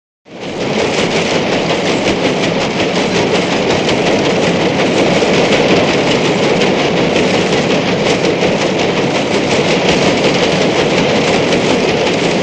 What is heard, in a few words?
Loom Running, loom